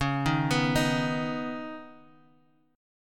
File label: C sharp 7th Suspended 2nd, C sharp, down; arpeggiated; chord